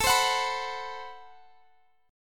A Minor 6th Add 9th, Guitar Half Step Down; Am6add9

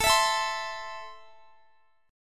A Minor, Guitar; strummed; chordshape; x,x,19,21,21,19

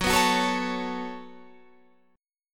C sharp 7th Suspended 4th Sharp 5th F sharp

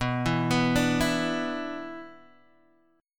B-Minor Double Flat 5th, Guitar Open G

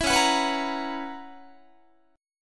Db Minor 13th Db Guitar; Dbm13 strummed; JGuitar

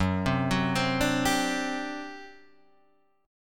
G Major 7th Suspended 4th F sharp

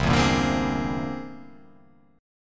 C Augmented 9th

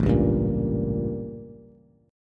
A 7th Flat 9th Bass; A7b9 strummed; JGuitar

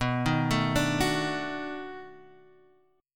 B 7th Sharp 9th B down Guitar Standard; B7#9 arpeggiated; chord shape; JGuitar

